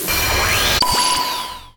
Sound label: Soldier